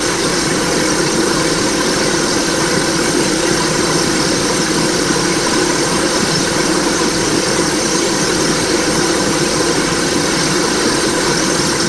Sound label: blender